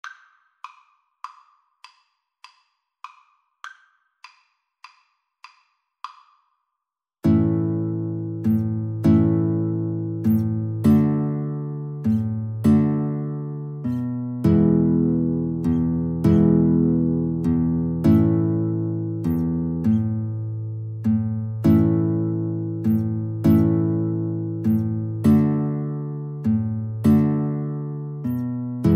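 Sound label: rugged cross violin guitar karaoke; Violin-Guitar Duet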